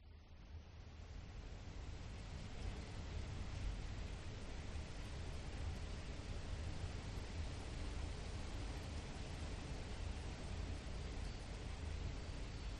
nature thunderstorm distant internal home closed windows light rain occ cars pass; zapsplat